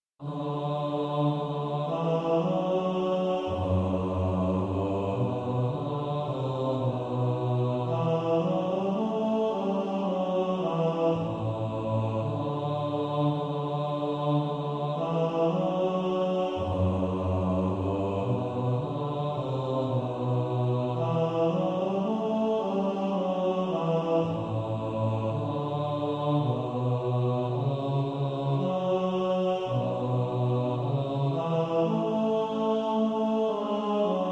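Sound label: bass, practice music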